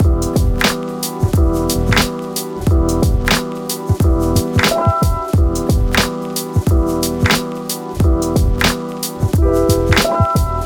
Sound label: Hip Hop Short Ident Loop